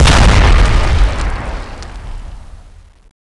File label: grenade explosion; proj impact; the monster plane; kolmods